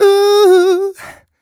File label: 128 uh e-flat, mal, Vocals